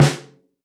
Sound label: Snare, drums; Ableton Live 12 Lite Core Library